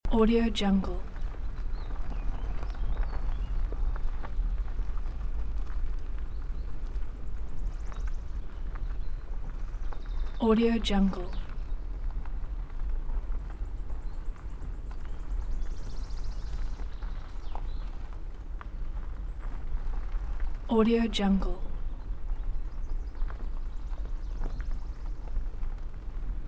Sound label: car driving slowly through forest loop